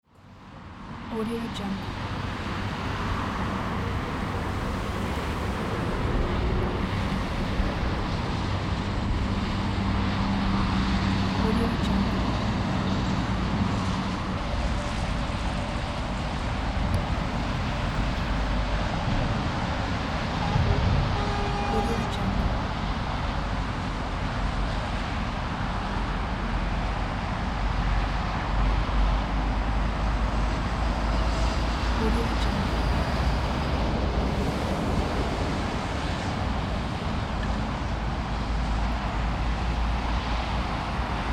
city traffic

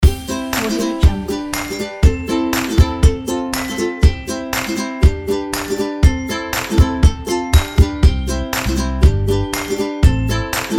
children

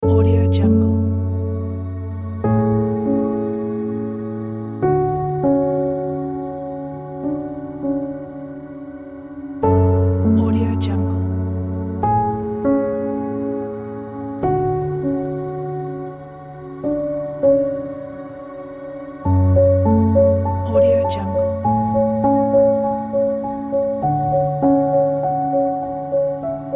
piano sad emotional; soundaudio

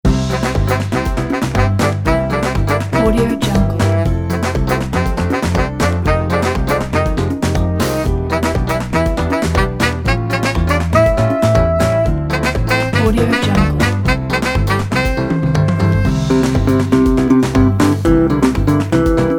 latina

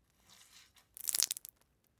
water or blood dribble; prosoundfx

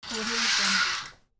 curtain

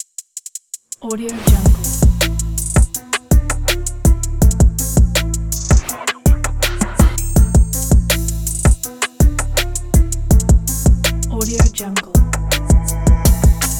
the hip hop kit

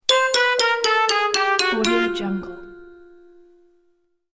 you lose game over

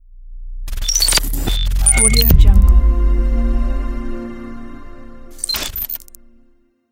the glitch logo

